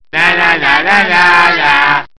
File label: Nanana Sms